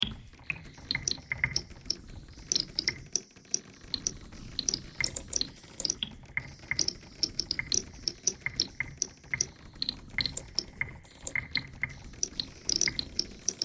bagpipe buzz

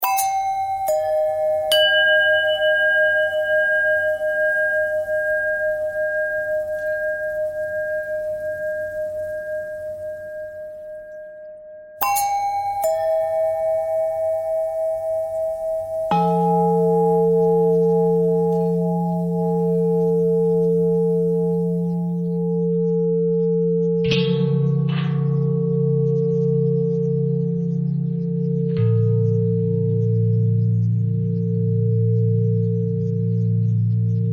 long bell bend threads

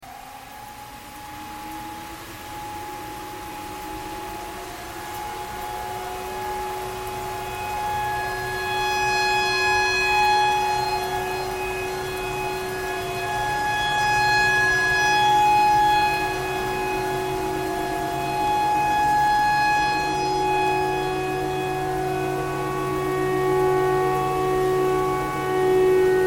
tornado siren

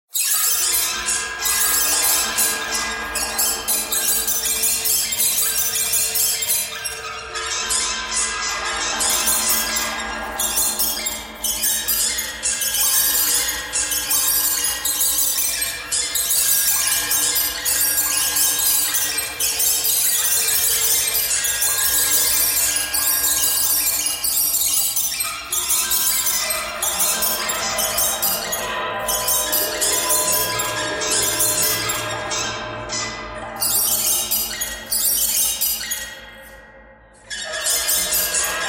birds thread pentatonic

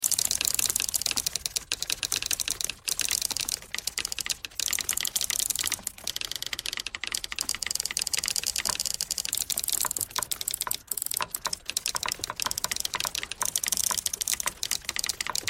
typewriter thread pentatonic all

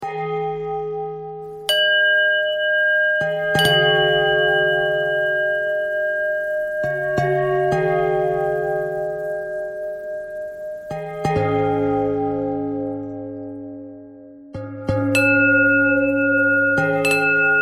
bell bell thread simple, bells

